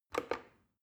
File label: plastic button start click regular fast